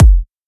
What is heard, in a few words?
EDM Future Kick